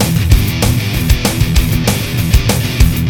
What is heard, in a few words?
Hard Core Rock